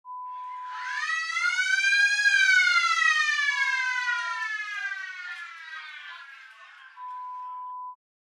Fairground Siren

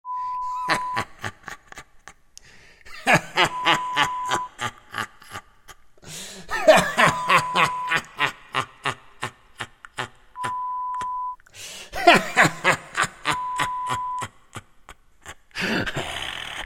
Evil Laughter sound

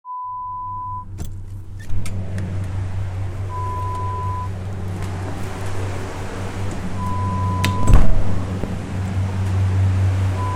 Walk in Freezer sound

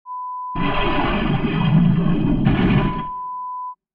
Grave Tomb Volt sound

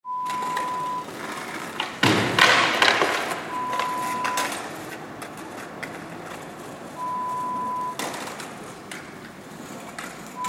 skateboarding sounds

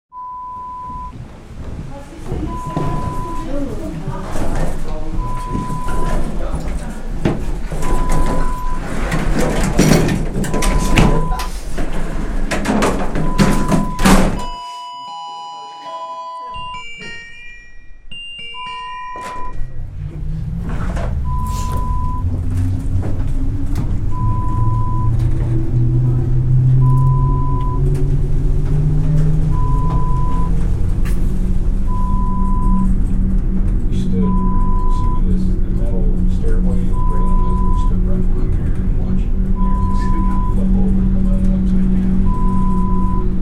Cable Car sound

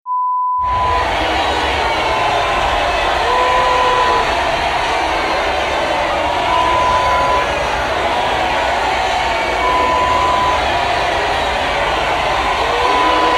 Cries from Hell Horror Sound Effect

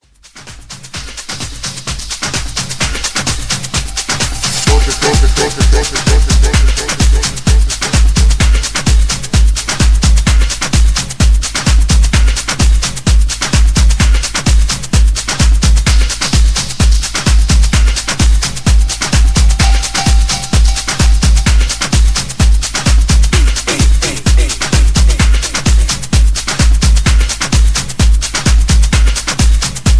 Drip House

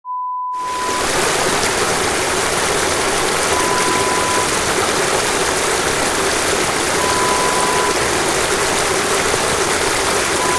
Fast Flowing Water sound

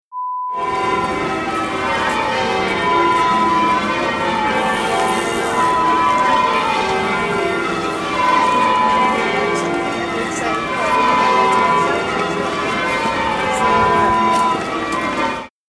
Wedding Bells sound

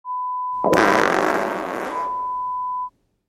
Fart sound

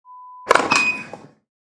Tennis serve

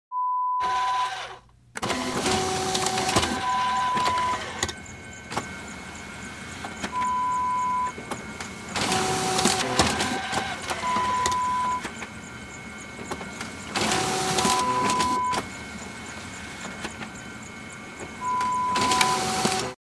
Photocopier sound

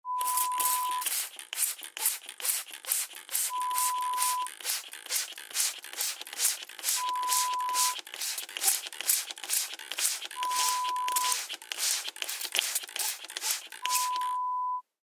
Vaporizer sound

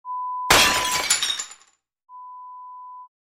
Window Smash

